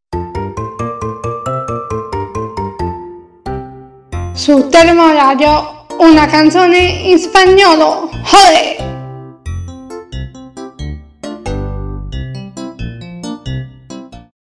Canzone in spagnolo

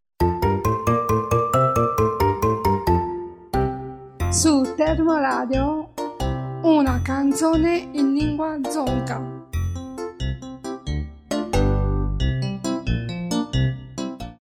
Canzone in lingua dzongkha

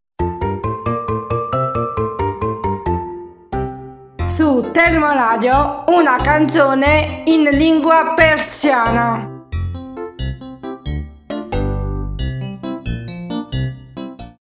Canzone in lingua persiana

